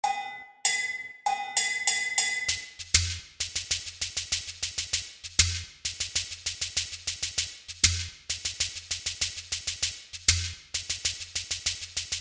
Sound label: chekere; Beaded gourd instrument originally played in sets of three or more